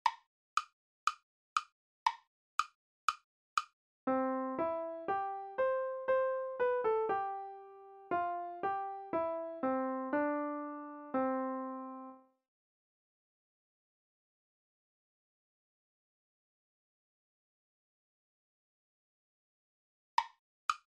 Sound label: melodic